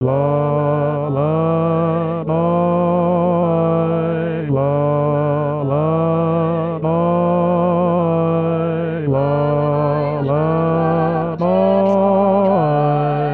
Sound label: Bas1